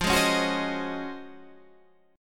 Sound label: Db Major 11th F